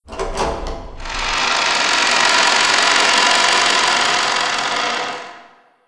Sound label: Doors Opening; Umineko